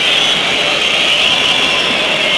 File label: whistling, Crowd; David Beckham Soccer; XBOX